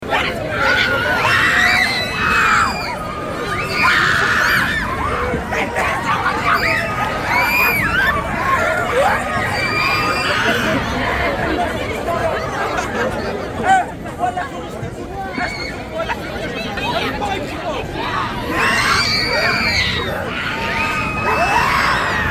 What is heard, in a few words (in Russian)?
kriki liudeq na extremalnyh attrakcyonah